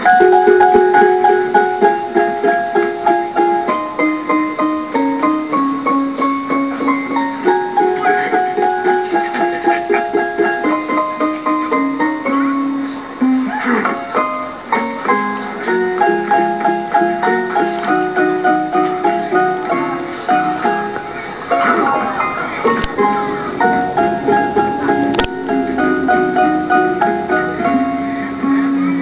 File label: Piano; Big